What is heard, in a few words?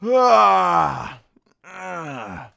yawning; foreman spike; SM64 CO-OP